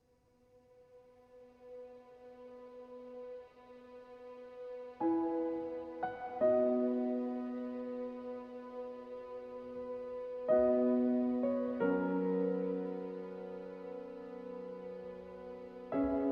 88bpmvar 4-4; Planet of Lana; Meet Mui Full Mix

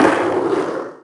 Skate Boarding; subway surfers city sound effects

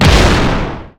Enemy Tower Active Shot; disney star smash android ios mobile gamerip 2020

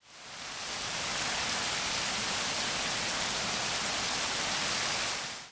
Rain Heavy; D4DJ Groovy Mix; Android, iOS, Mobile

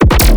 Bass Rhythm Pump 160 D; exciplex sample presets pack; JinoBeats

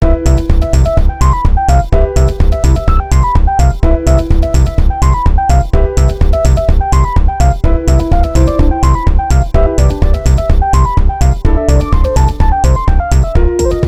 Pop; blazblue cross tag battle

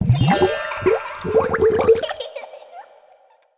Bubbles Win; hello kitty bubblegum girlfriends

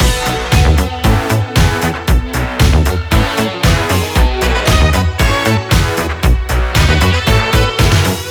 Main Gameplay Loop Short Gtr+horns

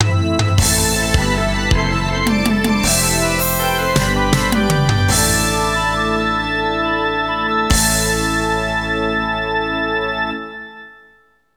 Final Stage Complete (GS)

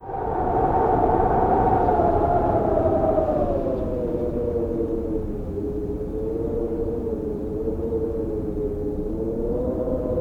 Sierr Howl

